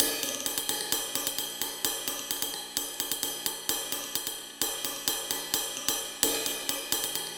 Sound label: Top Shuffle, Drums; Ghosthack; Drum Mega Bundle; Abstract Drums Volume 2